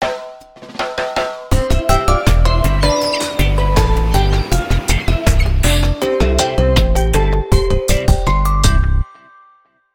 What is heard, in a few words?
Beach jingle